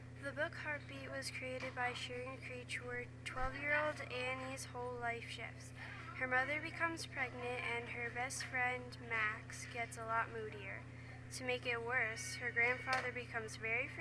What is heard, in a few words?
heartbeat